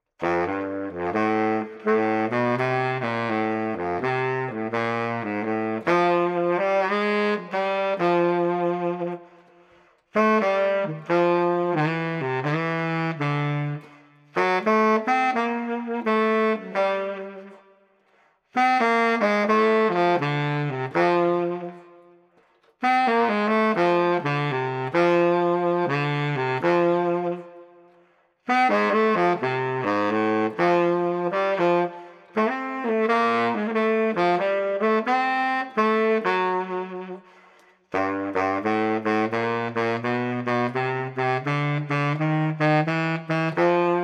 Street Baritone Sax Mellow 85

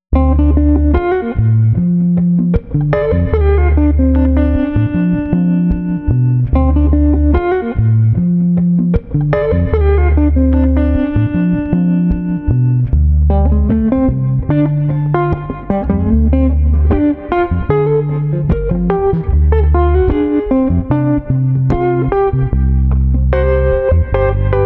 Guitar Bass Funky Chill 75

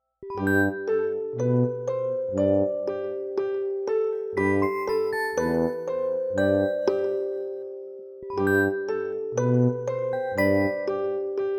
Playful innocence No Drums 120

